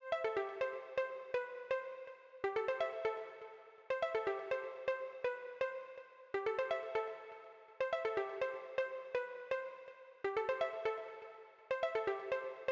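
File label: Joyful Pluck 123BPM F major Happy Clean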